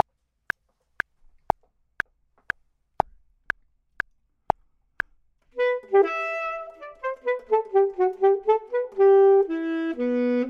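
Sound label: top, Saxophone and Clarinet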